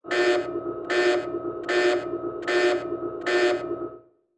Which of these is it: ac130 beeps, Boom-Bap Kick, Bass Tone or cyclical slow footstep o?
ac130 beeps